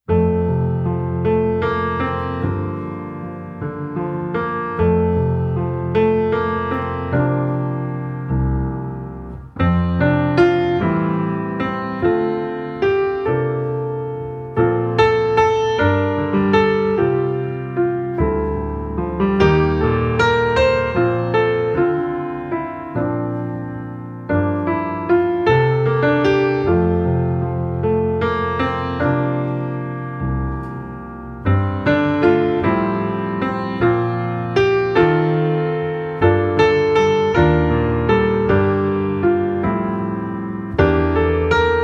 Piano Only

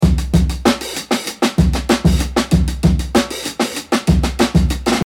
funk Loop

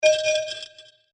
Error Alert

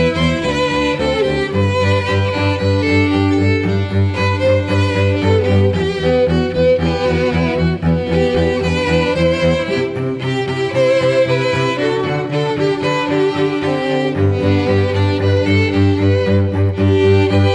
String Trio

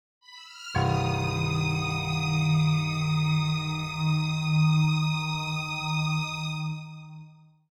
suspense

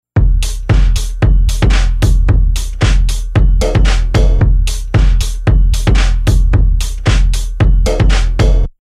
113 LO DRUM LOOP SNARE SIZZLER

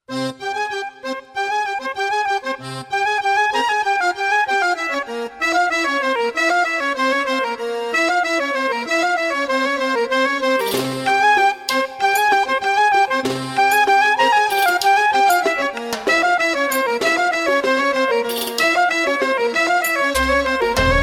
Constantinople Palace Dance

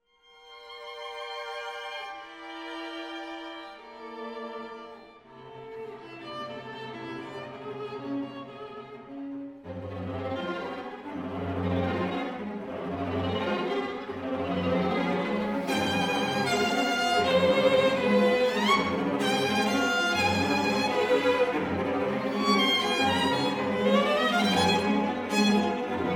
Nightmare for string quartet; from 'Am Limit'

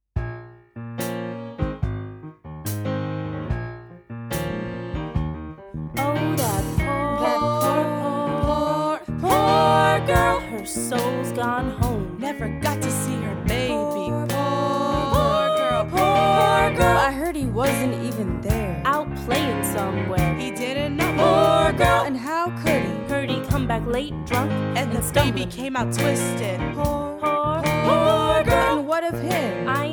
Gossip Chorus

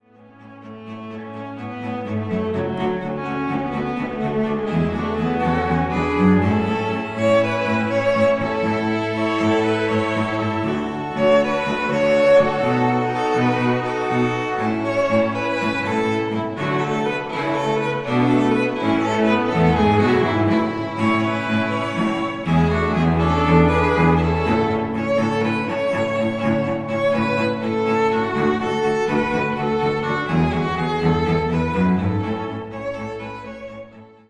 String Quartet; Wedding Music; Ann Arbor, MI; White Pines Entertainment

